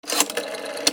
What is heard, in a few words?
PHONES